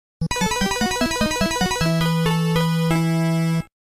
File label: Game Over Jingle; super mario xp